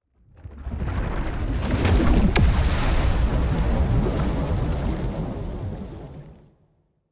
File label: colossus planet hit; aquatics